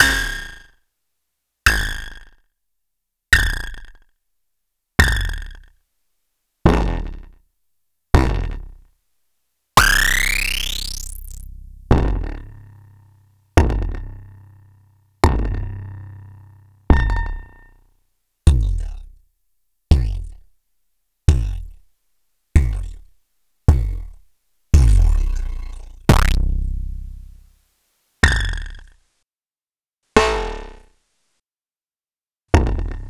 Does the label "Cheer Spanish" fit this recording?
No